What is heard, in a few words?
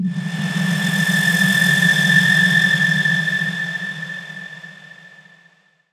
atmo; Techno Trance Essentials; FX & ATMO